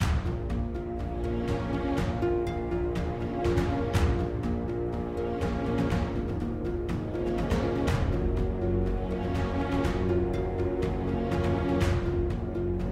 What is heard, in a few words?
Base Music Loop; forbidden Throne Desktop; Mahi Gaming